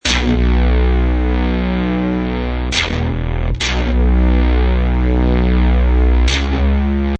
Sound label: Horr Synth Bass Bm, Synth bass horror loop in B minor at 135 bpm.; Bass Loops